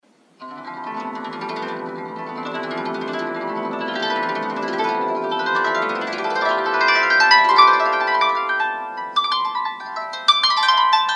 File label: glissando